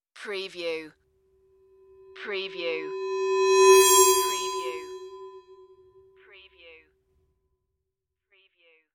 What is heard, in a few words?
bright whoosh